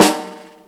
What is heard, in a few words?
Snares Real